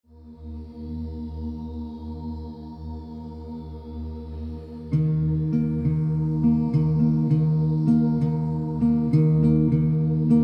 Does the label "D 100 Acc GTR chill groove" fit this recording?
Yes